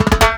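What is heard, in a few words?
snare roll, Ragga & Dub Reggae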